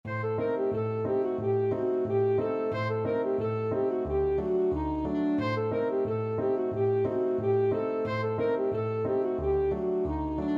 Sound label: ASAX, saxophone